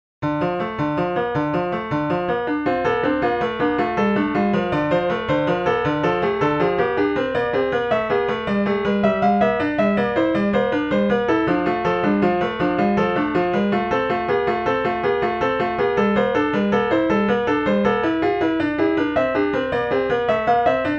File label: 16edo Invention